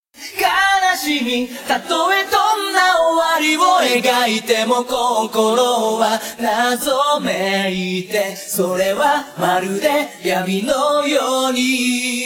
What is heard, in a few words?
Vocal